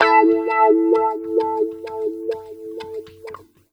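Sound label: guitar, Roots Funk; USB Soundscan vol.41; AKAI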